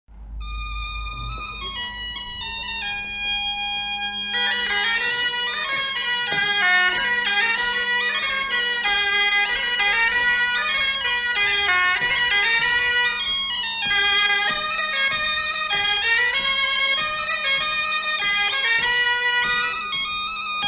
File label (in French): bombarde biniou kozh